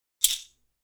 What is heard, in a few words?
caxixi